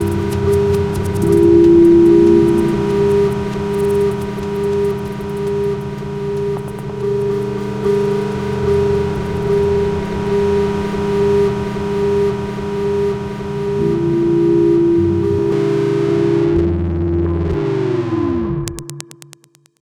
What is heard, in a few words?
jingle